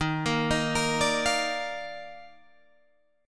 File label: D sharp Major 7th Suspended 2nd, Guitar, Drop D